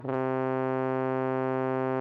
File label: Instrument